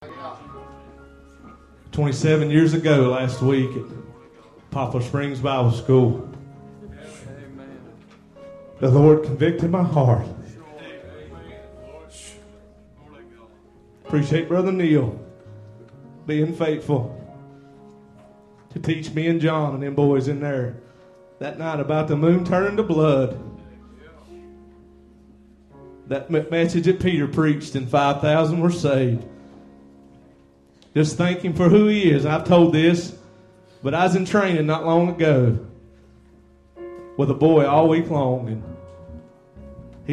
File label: jesus saves choir